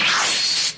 teleport sound

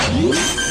spike plant; valorant

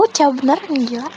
aullacuuuuuuuuuuuu

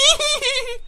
hihihihi

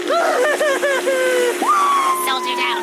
crying sound; ruby

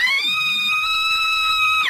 screaming in the bath; happy tree friends petunia

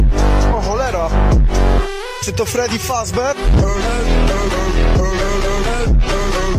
song but funk; freddy fazbear

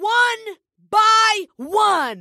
enemy ult; waylay

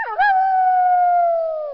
wolf howl

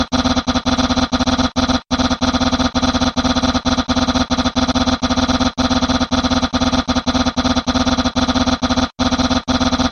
talk; papyrus

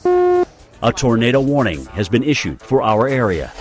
tornado warning prank

